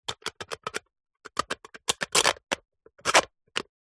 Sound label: soda can empty cut with serrated knife; foley